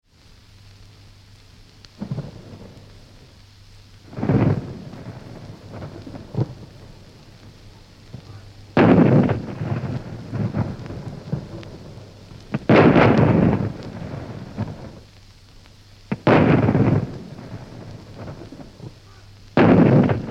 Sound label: Sound Of I, Sound of intermittent gunfire; From 78 disc; Battlefield; BBC